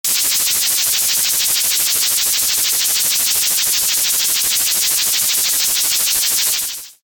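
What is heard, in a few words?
sprinkler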